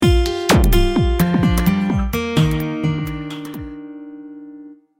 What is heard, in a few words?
Glitter Rock, ringtones; ICONIA; Acer Liquid E1